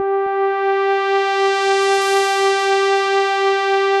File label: sawtooth